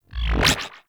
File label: Jukebox Enter, UI; DE; Warframe